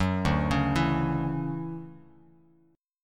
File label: D-Major 9th D down Bass Standard; DM9; arpeggiated; JGuitar